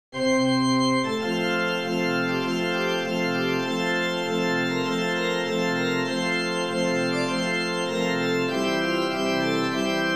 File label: Fundador Org Fmaj